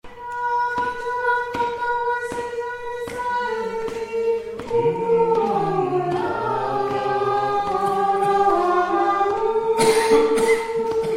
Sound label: word rhythm